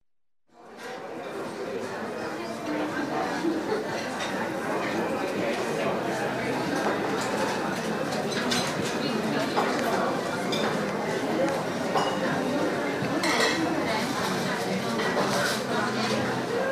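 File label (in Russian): звук большого ресторана посетители звон посуды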